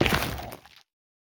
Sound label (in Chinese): 下界岩踩踏声